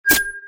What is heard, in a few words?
attack ping